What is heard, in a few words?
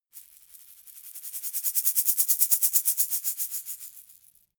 shaker plastic egg rattle dynamic